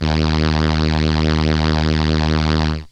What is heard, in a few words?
Solina Trumpet D#1; Analog Synths; Ableton Foundation FreePacks